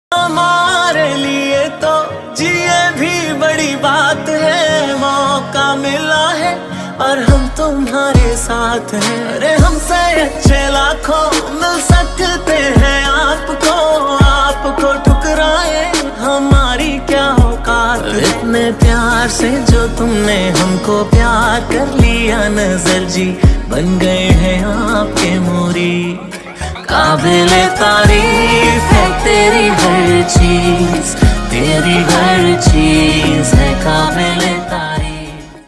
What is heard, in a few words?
Punjabi Beats Ringtone